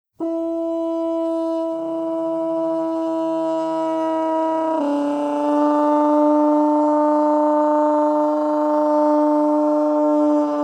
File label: throat horn